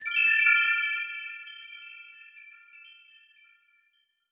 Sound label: windchime; Yume Nikki; Mosenite